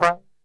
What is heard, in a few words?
F 2, Brass Super Section, TRMB SHOT; Best Service; 90 s Sample CDs